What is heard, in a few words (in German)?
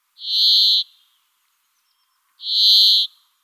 Bergfink Fringilla montifringilla; Vogelstimmen